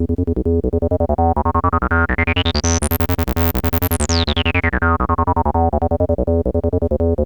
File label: TB-303 LOOPS